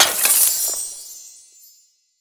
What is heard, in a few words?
bonus Bomb Coins; netent